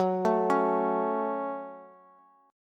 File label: Gb Minor Bass; arpeggiated; JGuitar